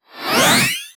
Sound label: anime style evolution sound, transformation